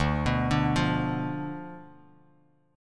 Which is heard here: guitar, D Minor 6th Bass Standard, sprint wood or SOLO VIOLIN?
D Minor 6th Bass Standard